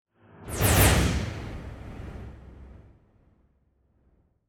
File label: Symphonic Hit Short Finale - Whooshing; Hollywood Edge; sound designer's toolkit v2